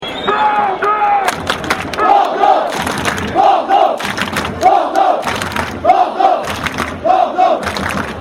Bordeaux, Chants

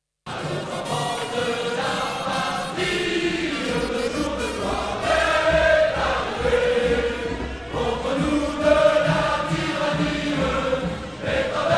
La lensoise, Chants

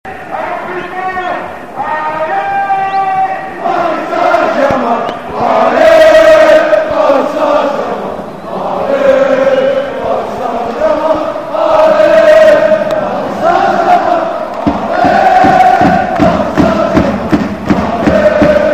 Supporter du PSG, Allez paris saint germain, Chants

